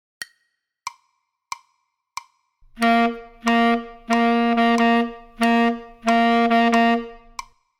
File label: Tenor Class Example A, Saxophone; Example 21a; Master Class #21 -- Playing By Ear; Recordings For Beginning Jazz Saxophone Studies for Tenor, Volume 2